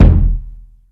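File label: kick Thick, Akai MPC5000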